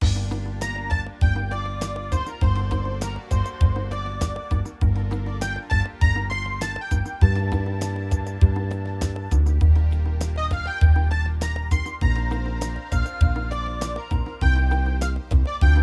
midi

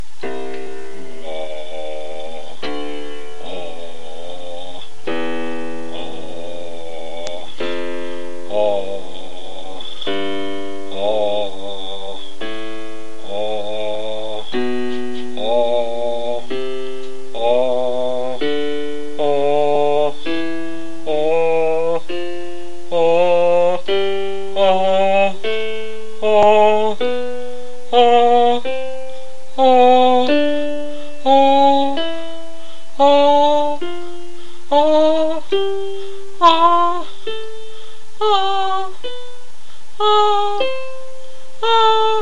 five octaves

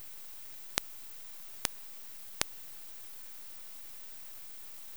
3 clicks

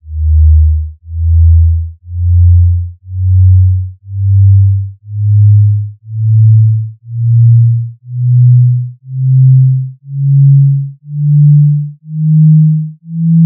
sin Scale

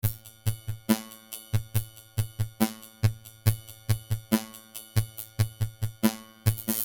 Classic Rock Beat robot

